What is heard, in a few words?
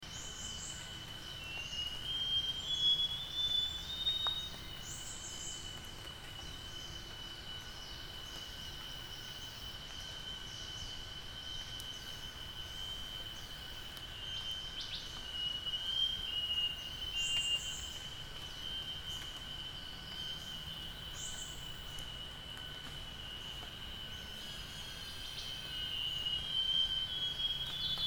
Scaly crowned Babbler at Kubah NP Sarawak Borneo